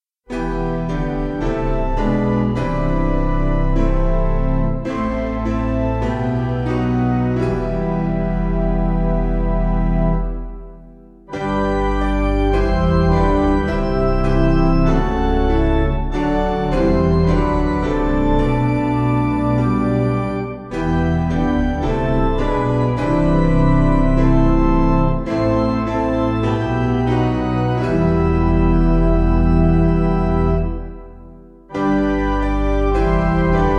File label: Piano